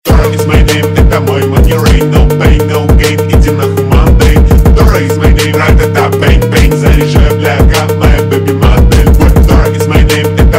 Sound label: Money Rain Phonk Remix